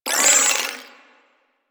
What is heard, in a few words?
Flare Impact; Warframe; DE; Sentients; Sentient Trooper